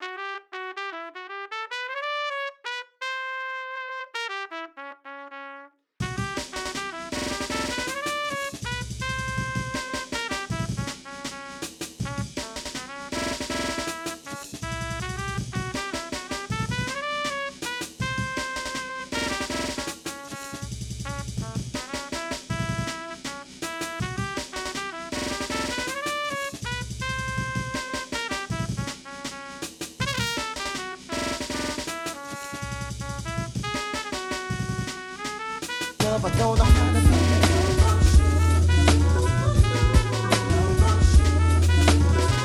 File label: Chopped amen with a trumpet